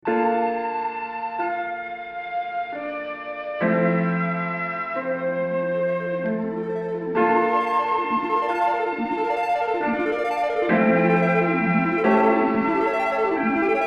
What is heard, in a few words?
Keys Capitol Chambers Short Decay; UAD Spark